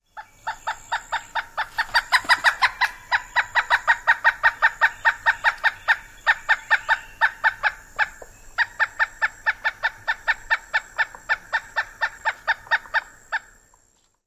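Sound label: pileated woodpecker long call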